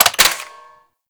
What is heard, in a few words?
Hand Shotgun Mech; Warframe; Grineer